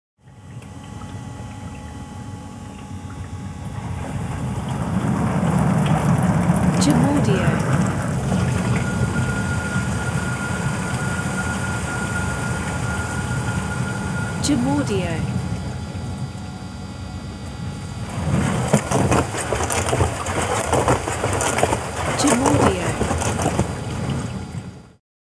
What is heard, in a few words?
Dishwasher